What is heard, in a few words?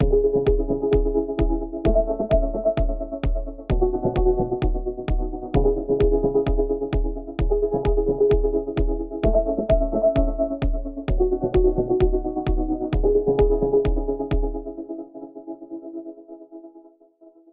Chords